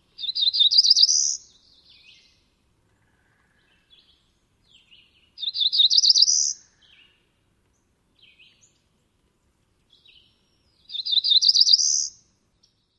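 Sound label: songs, Cerulean Warbler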